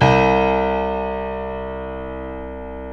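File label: grand piano C5; DANCE PNO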